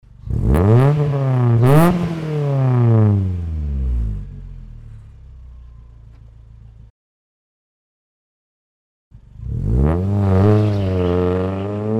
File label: exhaust, mazda; catless midpipe vs catted midpipe